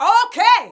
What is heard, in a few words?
VOX VOX; Sound & Vision - Gigapack II CD 2